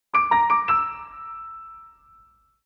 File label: Video game reward chest opening high piano